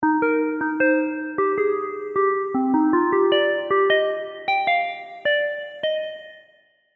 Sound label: Eb 160, Future Bass & Melodic Trap MIDI Melody; Surreal